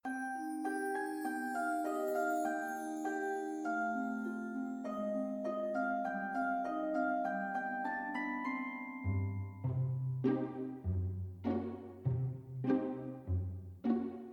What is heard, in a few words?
ambience music; PhoenixCraft 1.16.5; wizard shop